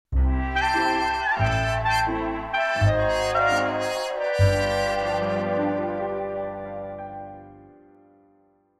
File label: Sitcom or Animation Transition; The Mountain